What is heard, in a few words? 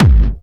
hardbassdrumz, breakcore; Bazzdrumz